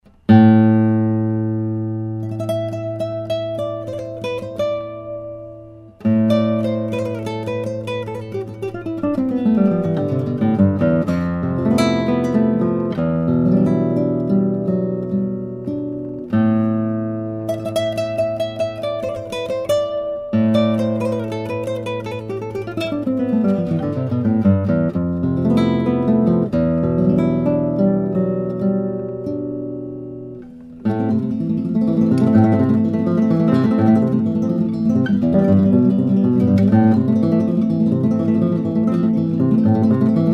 Velazquez Torres, nylon-string guitars, classical guitar; concert